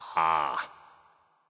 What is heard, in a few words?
grunt understand ah; bacchus